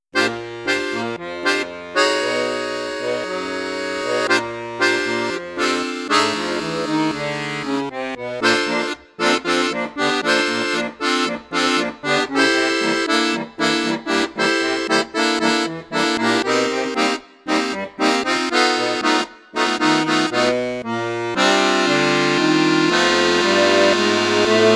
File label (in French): registre flute juste, accordéon; mengascini f5